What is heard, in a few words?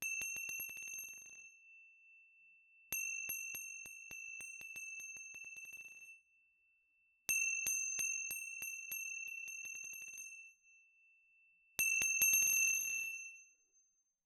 Tibetan Texture, Tibetan Cymbals; Percussion Samples